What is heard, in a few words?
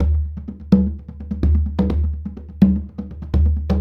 Congas; Essential Percussion